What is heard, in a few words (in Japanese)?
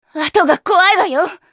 ダウン; ナタリー